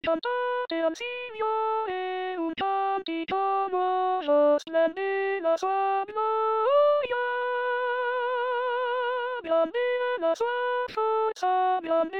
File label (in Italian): Voce